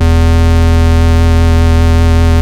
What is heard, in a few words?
sub osc, yamaha rm1x synth bass and lead